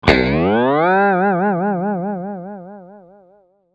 Electric guitar spring

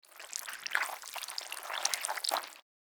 Slimy organic movement wet slithering; slug and worm; squelchy and organic

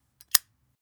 Zippo lighter close

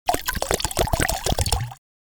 Water pour into plastic bucket; gfxsounds

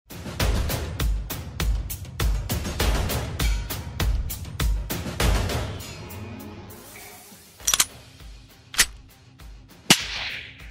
guns bundle

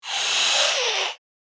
Vampire hiss monster aggressive

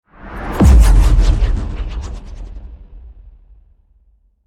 Subwoofer bass impact cinematic trailer

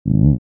Stomach gurgling and rumbling short

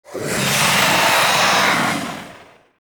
Young dragon roar

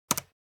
Laptop keyboard keystroke pressing single key

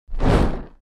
Heavy fire movement flame whooshing

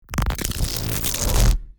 Energy particles whoosh

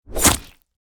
Spear impact body hit gore blood

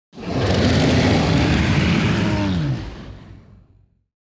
Dragon aggressive roar attack

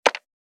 Cash register checkout single button press

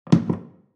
Placing glass on shelf; household

